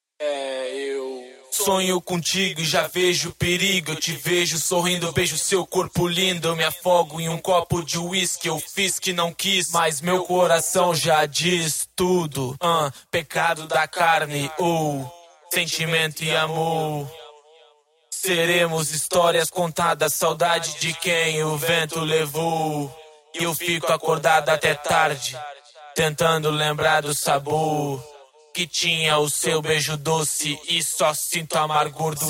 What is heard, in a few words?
rap, 93, Em, acapellas